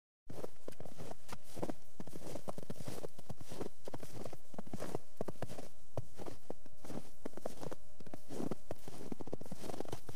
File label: Snow footsteps